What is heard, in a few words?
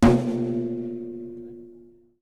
tom single